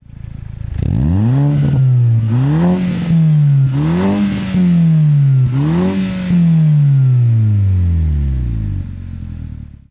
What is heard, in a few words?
car